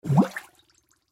Fart Bathtub Bubble, Water Fart Bathtub Bubble Surface Shallow Gurgle; Fart Sound Effects; Blastwave